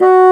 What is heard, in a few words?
Orchestral Winds Bassoon; Roland LCDP04; WND Bassoons